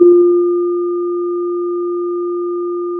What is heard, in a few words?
Sound of a church bell